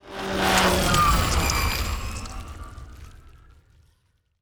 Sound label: ant ship science move; biogenesis